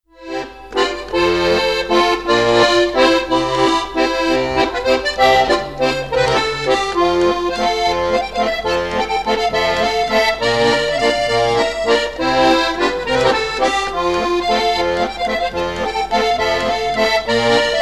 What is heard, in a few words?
Harmonica Hohner Erica in CF, Wals; Staalkaart